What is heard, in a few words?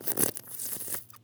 glue slurp